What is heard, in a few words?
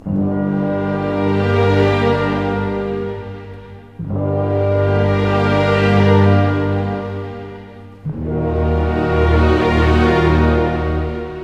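60 dark, orchestra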